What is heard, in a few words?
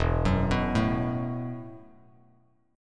E Suspended 2nd Suspended 4th E, Bass Standard